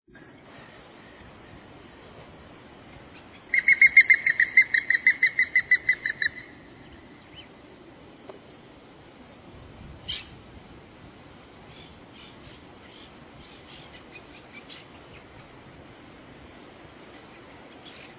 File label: Plumbeous ibis; پرنده اکراس سربی